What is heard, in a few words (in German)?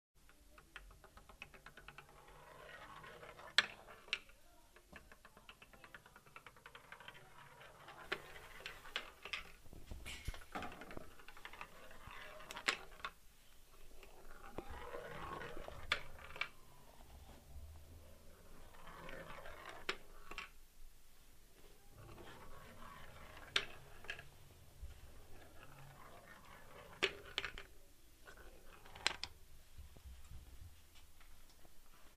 holz rollt vom tisch